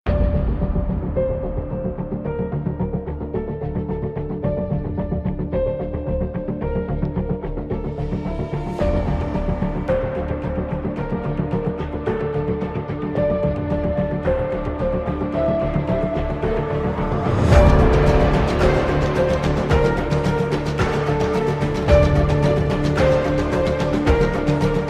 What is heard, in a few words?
epic suspense trailer music royalty free background music instrumental